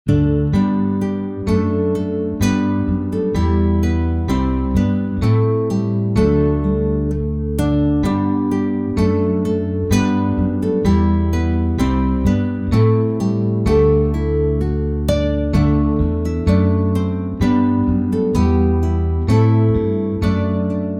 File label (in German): 64bpm